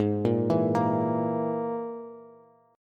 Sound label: Ab Suspended 2nd Sharp 5th Ab Bass; arpeggiated; JGuitar